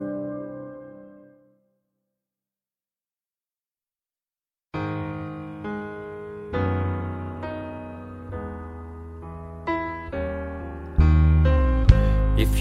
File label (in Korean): 영어남 노래